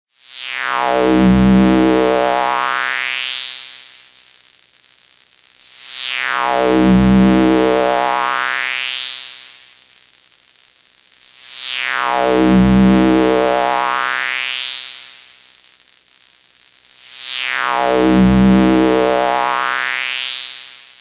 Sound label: highpass